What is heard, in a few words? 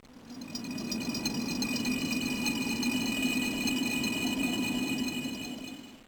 jingle; Monster Rancher Advance; gba